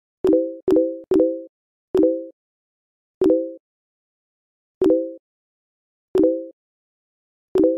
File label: Facebook message sound effect